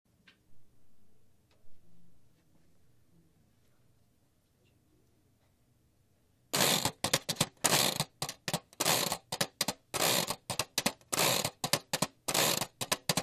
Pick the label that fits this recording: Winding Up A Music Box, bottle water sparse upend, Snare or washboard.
washboard